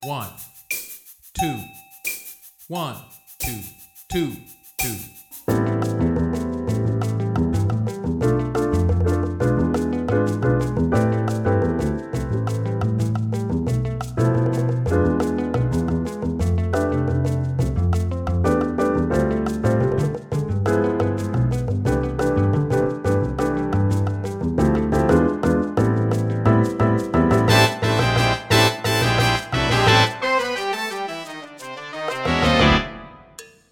Drum Kit Play a Long Latin WITH CLICK, Jazz; Jazz-Band; All-State

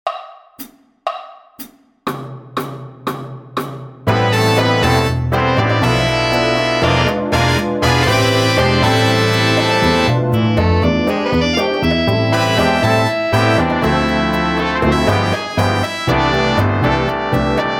DRUMS Playalong Jazz Etude BOSSA; All-State; Jazz-Band

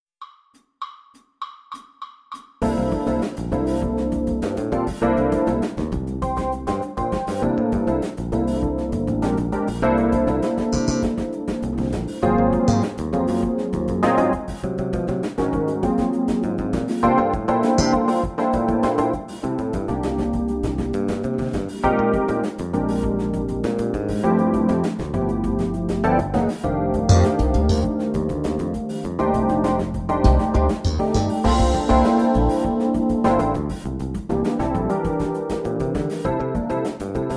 Vibes Playalong FUNK; Vibes Etude A-2 Funk Track; 2025 Nebraska All-State Jazz Band

